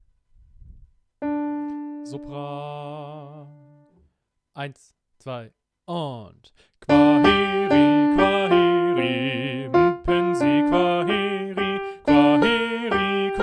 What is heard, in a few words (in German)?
Sopran allein; Kwaheri